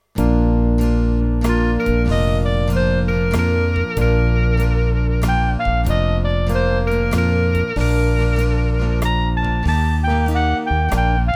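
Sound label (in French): menuet, si bémol majeur; haydn joseph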